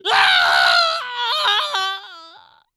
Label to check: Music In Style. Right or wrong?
Wrong